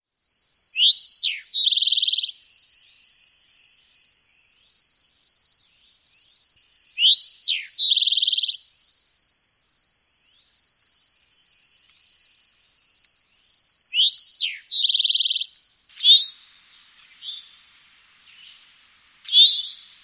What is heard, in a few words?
eastern towhee